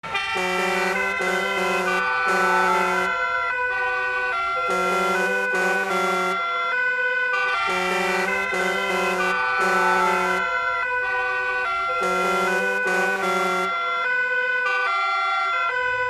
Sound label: Emergency vehicles multiple sirens passing by city traffic